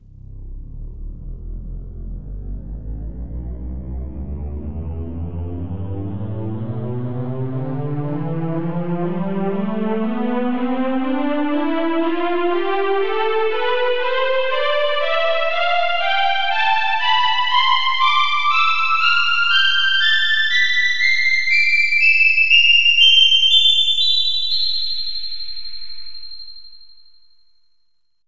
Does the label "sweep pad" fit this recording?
Yes